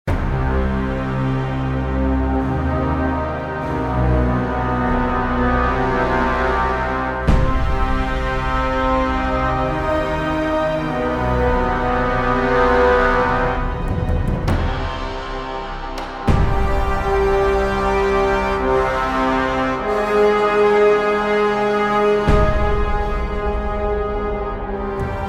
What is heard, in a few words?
Vindication Ambience; Red Faction Guerrilla